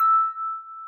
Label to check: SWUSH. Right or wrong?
Wrong